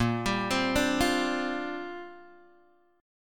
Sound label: Bb 6th Add 9th Bb Guitar Open G